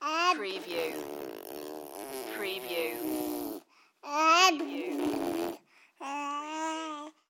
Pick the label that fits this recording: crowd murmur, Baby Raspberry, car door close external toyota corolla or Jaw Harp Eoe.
Baby Raspberry